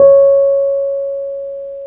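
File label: Wurly C#7, piano; Keyboards of The 60's and 70's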